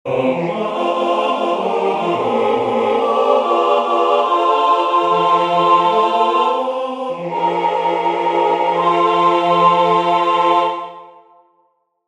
All Parts, barbershop tag

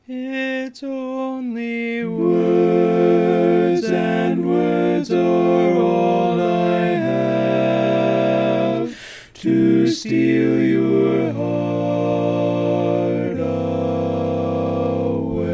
Lead, barbershop

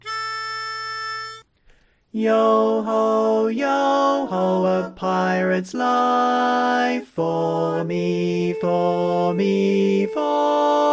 female bass

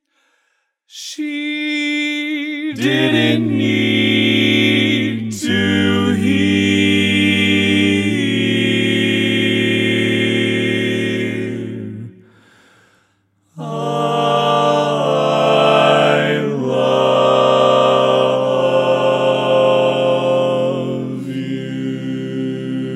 barbershop tags, all parts

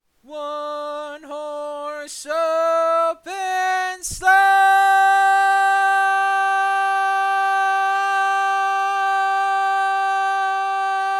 Tenor, barbershop tags

